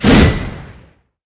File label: swing thrust